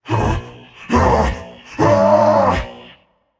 fear, voicepack; smite; jorm

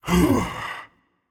sigh, voicepack; smite; jorm

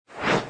sword swing; Smite; Cabrakan

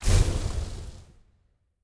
projectile; smite; god serqet

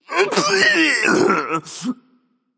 clear throat, voicepack; smite; jorm

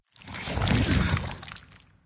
slither long; smite; scylla

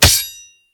Hit; smite; god susano

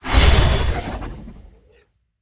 fire; smite; hera

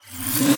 buildup; smite; baronsamedi skin7

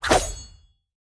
scorpion attack spear; smite; npc

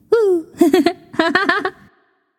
yay; smite; artemis

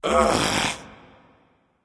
ugh; smite; ram

